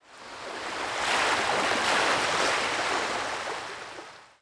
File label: WAVE, water; zones